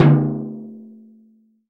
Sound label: TOM, CTD TOM MLT